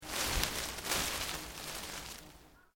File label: PLANT LEAVES SMALL MOVE SLOW, rustling; Material components for constructing more complex sounds